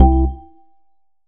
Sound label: select